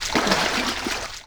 water walk deep

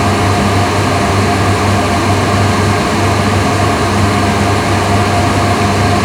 T72 Engine Max; DCS

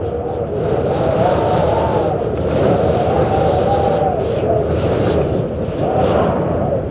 Amb Weather; Red Orchestra Ostfront 41-45

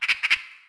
magpie; World of Tanks Blitz; ambient medvedkovo

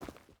footstep boots forest walk; Rust

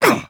vce punch

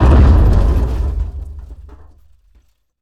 Wings Damage In; DCS

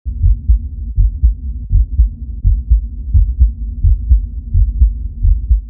hearts ambience

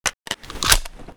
Inf Weapons Foley; Red Orchestra Ostfront 41-45

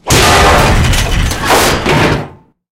hit treads, tanks

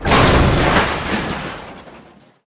objects, World of Tanks

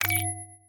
chat join; War Thunder

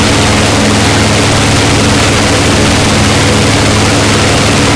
b25 side, engines; War Thunder

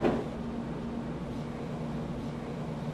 P-51D Gear Loop; DCS

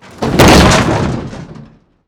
furniture knock over; Modern Warfare

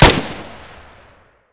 gyrza close; Escape from Tarkov

